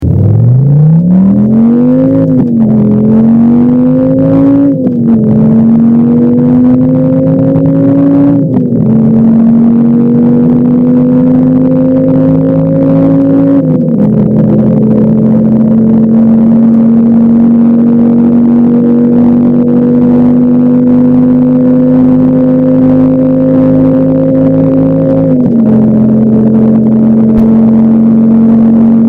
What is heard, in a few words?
Carsounds Dodge Viper GTS 1-6 gear